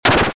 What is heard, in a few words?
Spider Shot; Doodle Jump; 3DS, Android, Arcade, DS, iOS, Mobile, Xbox 360